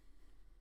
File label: Voc